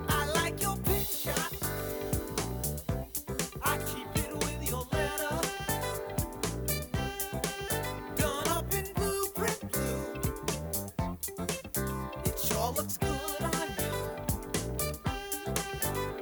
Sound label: Crosley CR249 Turntable